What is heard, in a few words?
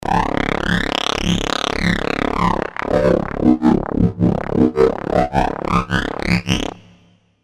K5k AM Bass